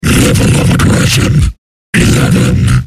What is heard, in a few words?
fleshpound alert; slender